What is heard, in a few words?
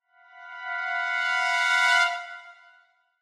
Surprise